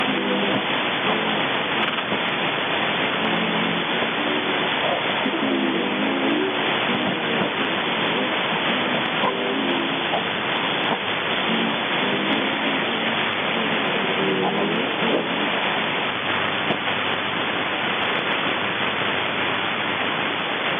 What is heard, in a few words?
9453 kHz AF 9450 kHz; HDSDR; GITAG No2 Episode XVI